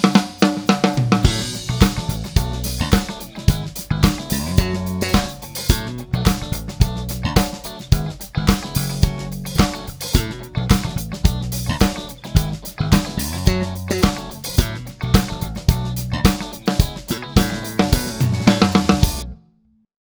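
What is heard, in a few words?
Slap PJ both pickups mix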